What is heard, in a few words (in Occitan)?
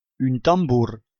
tambor; occitan; Lo lop-cervièr; jòcs